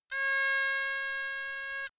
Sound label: haegeum